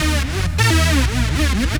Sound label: Stab Hoover